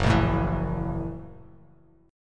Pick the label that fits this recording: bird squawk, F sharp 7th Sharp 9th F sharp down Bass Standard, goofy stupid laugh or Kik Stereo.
F sharp 7th Sharp 9th F sharp down Bass Standard